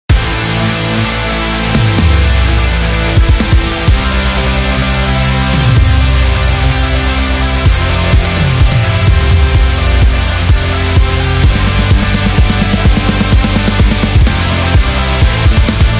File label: Motocycle, metal